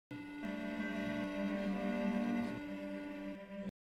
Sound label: D string overdubs; 8va node; fivefingers; For string performers